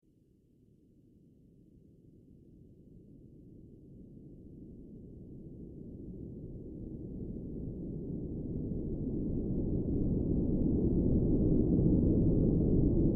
Building Whoosh Faint

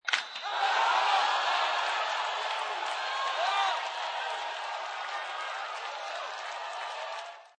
Baseball Hit Crowd

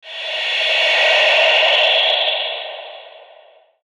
Static Smooth High Frequency Swell

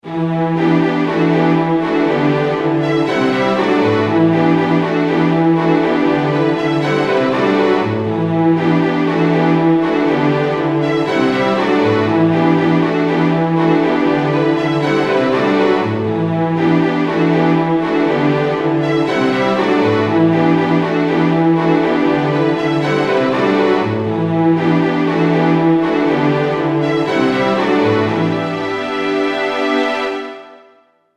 Orchestral Remix, string loop

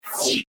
Laser Vacuum, science fiction sound effect; Laser Sound Sound Effects; star wars / trek style

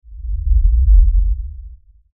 Lfe Rumble By Swell Low Bass Movement

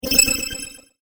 Magik Spell Whoosh; Whoosh Sound Effects

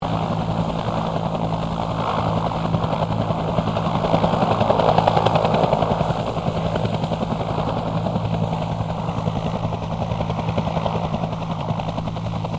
HELICOPTER BELL UH1 IROQUIOS TAKEOFF TROJAN; RAN UH-1 Iroguios; Helicopters (Sound Effects:Vehicles)

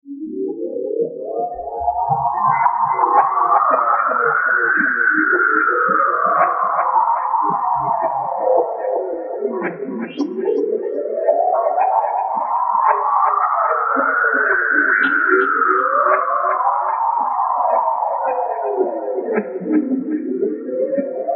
Bed Wind Slow Wah; Bed Sound Effects; Blastwave; Production Element Imaging Element Accent Transition

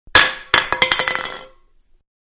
Soda Can Fall On Wood; Metal; Materials; Can Soda-Falling Onto Wood; Manhattan Production Music

